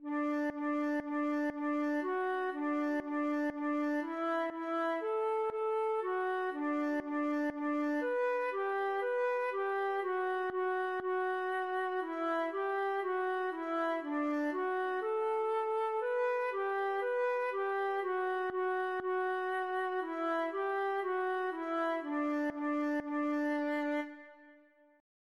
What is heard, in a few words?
rakevet, feadóg